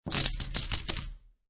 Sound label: Poker Chips Stack Of Chips Dropping On Felt; Gambling; Lynne Music